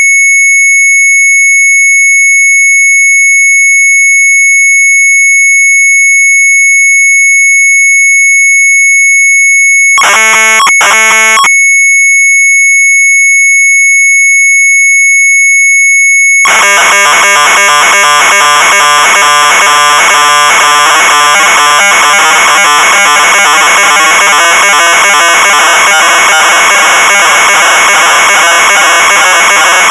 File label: MZ800 Tape, sharp; MERCATOR; retromelsarchive